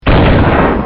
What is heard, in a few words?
Bomb